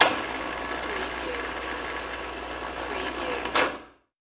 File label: Chain Crain Type